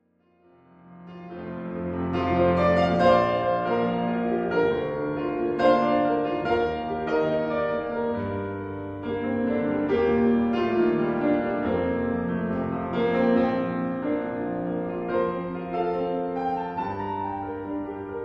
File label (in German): Pop Impro